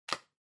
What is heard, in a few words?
Bingo Card, Punch